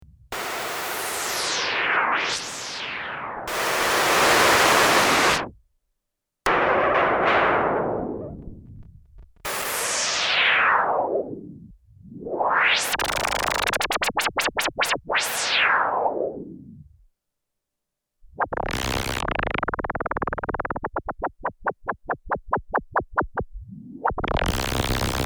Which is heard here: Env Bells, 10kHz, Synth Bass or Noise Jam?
Noise Jam